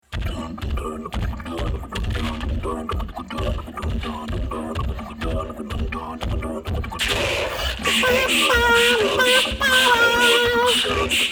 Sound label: kiss